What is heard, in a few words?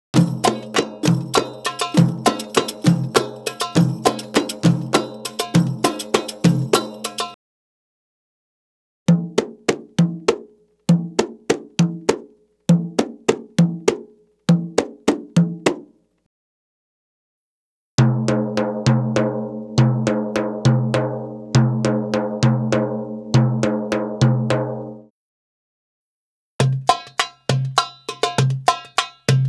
Arabic Rhythms